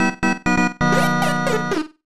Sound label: Death; Libble Rabble